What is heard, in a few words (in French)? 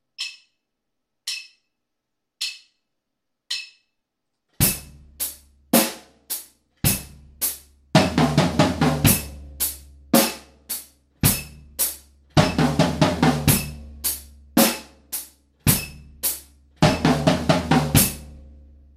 break quintolet